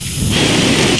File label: wind fire; firewind